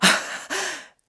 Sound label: Celebrate; Link celebrating his Wing Ceremony victory; Skyward Sword